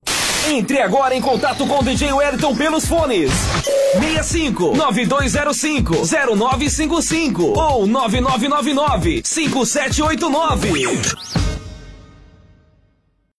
POP Hits

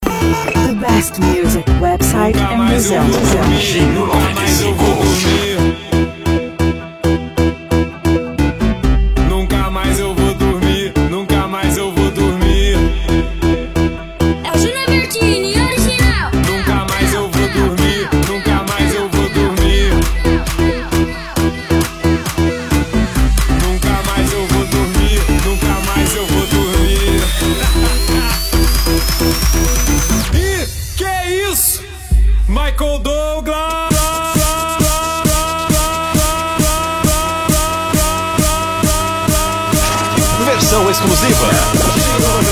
Funk Production Remix

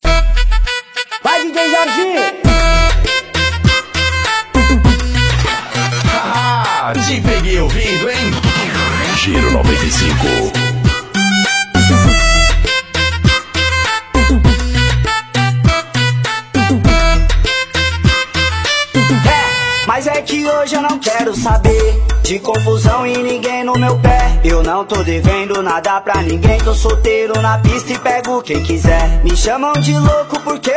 Funk

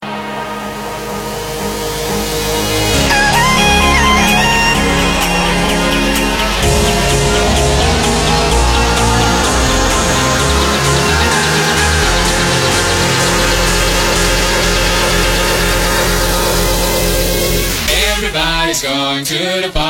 Electro

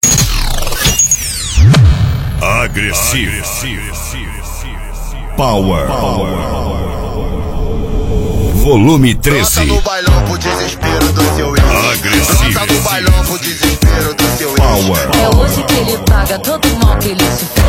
Baterias Agressive Power; Esp de Verao 2020

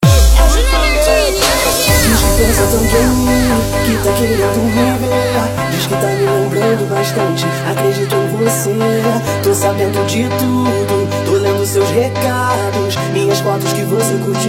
Funk Production Remix

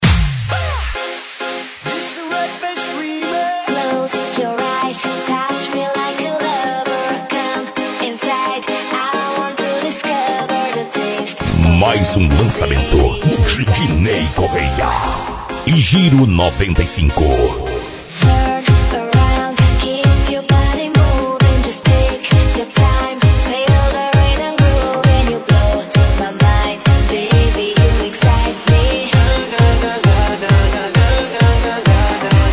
Vibe Dance

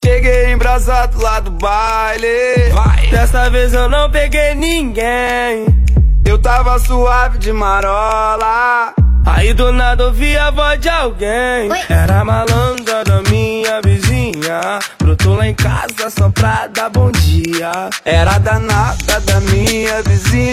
Funk

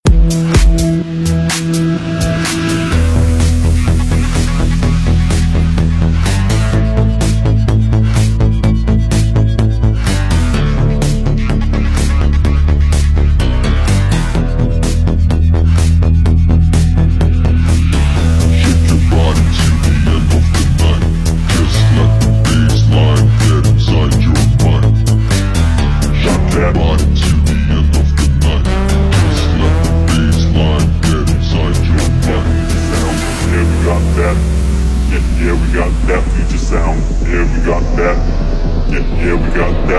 In The Bass

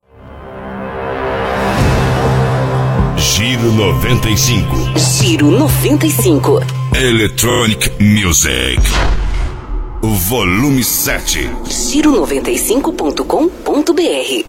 Electronic Music

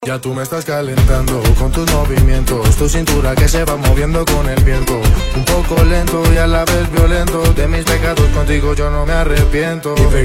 Reggaeton

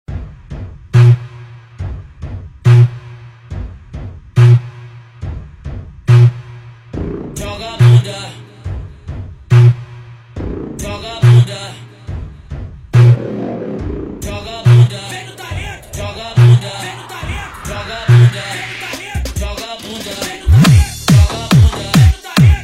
Bass

